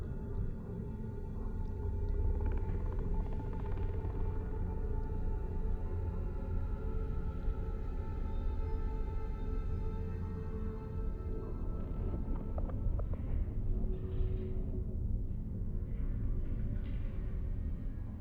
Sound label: basic dungeon living loop, ambiences; michael ghelfi